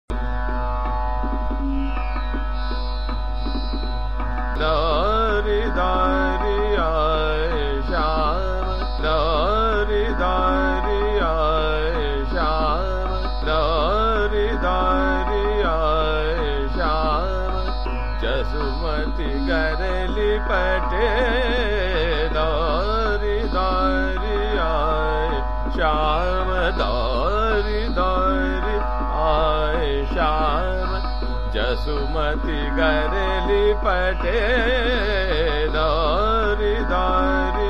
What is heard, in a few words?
Chota Khyal, Ektal Drut; Douri Douri Aaye Shyam